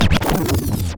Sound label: Backspin; gc ps2 gamerip 2001; nba street